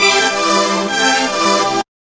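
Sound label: string riff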